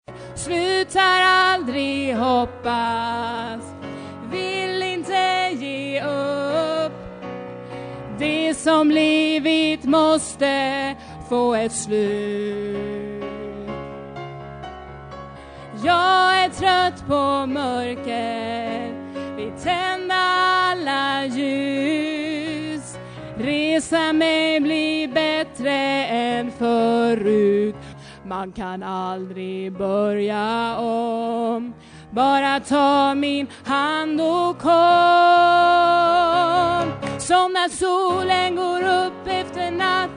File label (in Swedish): sopran